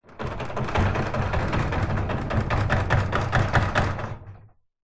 household garage door wooden closed impacts rattling; zapsplat